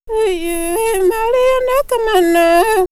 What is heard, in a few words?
Ethno Vox; Zanzibar; X-Static Goldmine; vox mix